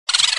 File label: boo